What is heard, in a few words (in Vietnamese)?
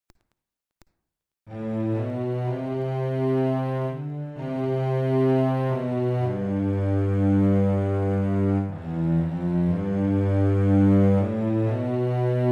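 Nhạc nền giai điệu violin buồn ngắn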